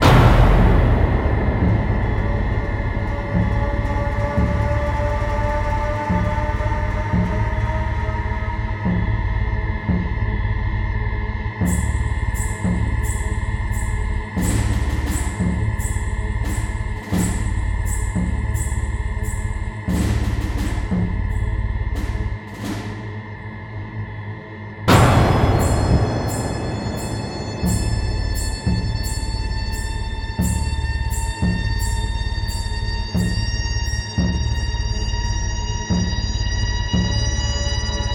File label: Rail Car Attack Loop; Silent Hill Downpour